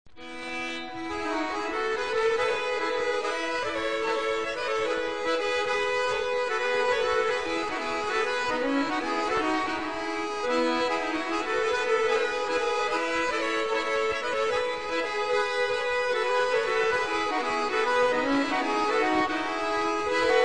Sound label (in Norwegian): Bjorkedalsvals, folkemusikk